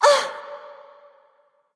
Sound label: grunt hurt; smite; kali